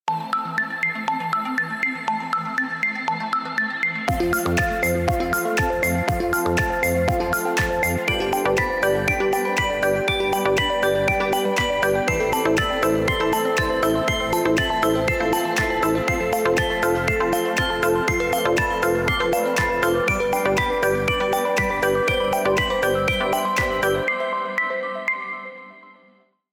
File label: Dance; Infinix Zero Flip